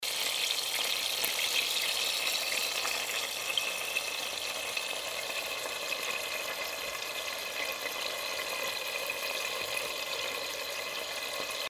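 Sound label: sound of frying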